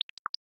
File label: vector touchdown, phone tones; LG K10 T-Mobile